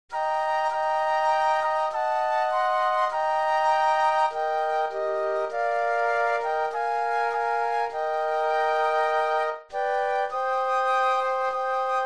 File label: Flute Trio